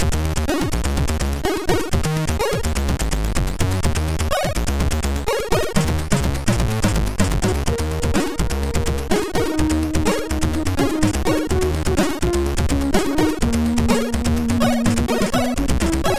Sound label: Background Noise, MOS6581R4